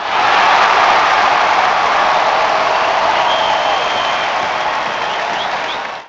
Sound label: Cheer; Grand Theft Auto Vice City; XBOX